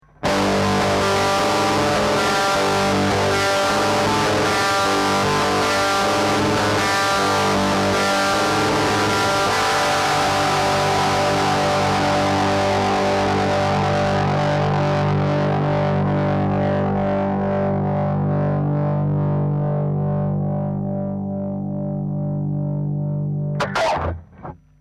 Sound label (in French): disto arpege